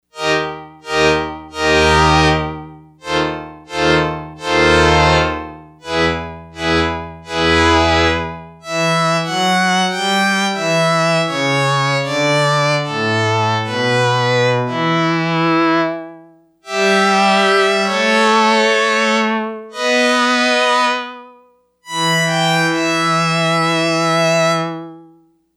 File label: Strings, Yamaha DX100; Factory Patches